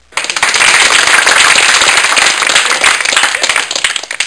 Applause Only, Med Older Crowd; Soundsation; Human

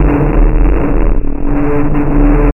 Noise; alone in the dark 4

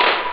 glas, drums claps; OctaMED SoundStudio V1

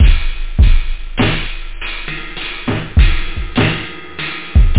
breakbeat; algomusic; rhythm

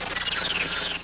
TINGLE

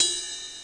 rid bel, midi

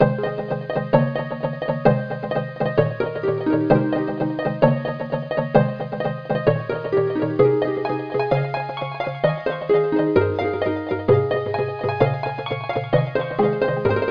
Harp; Rolly

